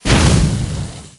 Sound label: fireball hit